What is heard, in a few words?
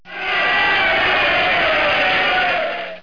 Cheering